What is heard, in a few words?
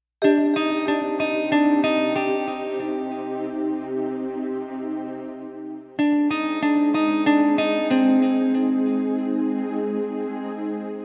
Waiting, Tension